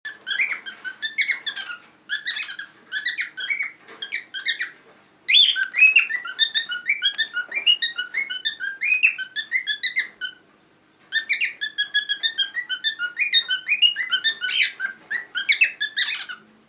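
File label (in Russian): popugaj korella golos, cockatiel parrot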